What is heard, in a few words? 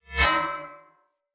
Spear Whoosh Accent; Weapons; Enemy; Spears; NimrodSpear